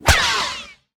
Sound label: light hit, saber